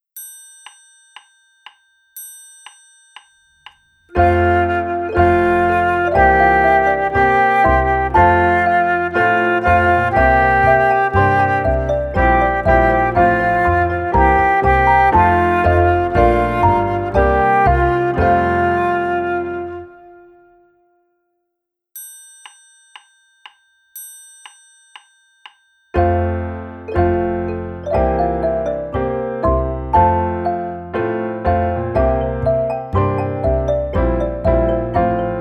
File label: fanfare